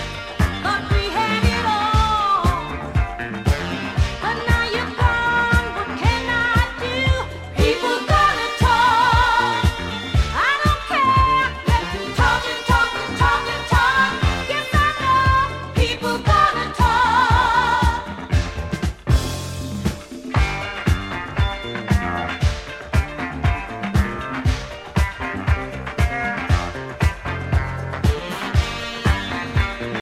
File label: Afro Funk Disco Boogie West African Disco